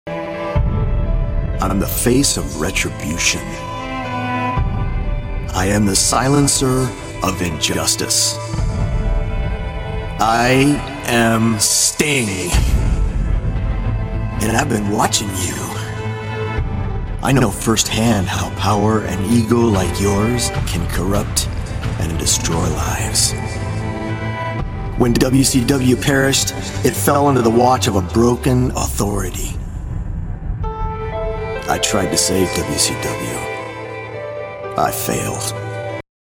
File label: pitch corrected; sting